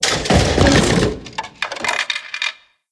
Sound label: Vending Machine; blue archive